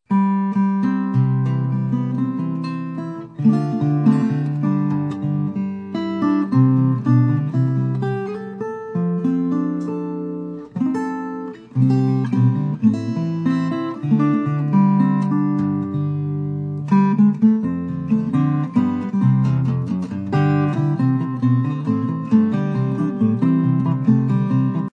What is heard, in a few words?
Grand Old Rag Parlor Guitar